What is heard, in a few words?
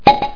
Crk pop; Master Clips Email Animator